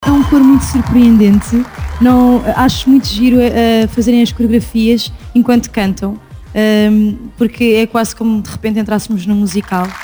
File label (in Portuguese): Coro Mozart